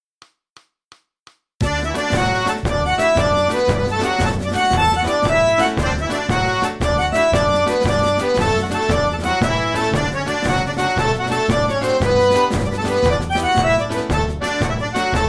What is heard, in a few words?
Traditional Irish Jigs D